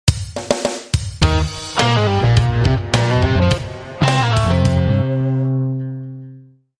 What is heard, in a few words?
Grooving